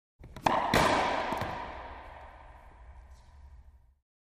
Racquetball Serve; Hard Hit With Long Reverberant Ring Out